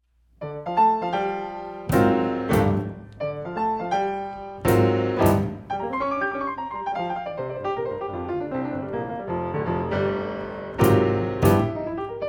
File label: blues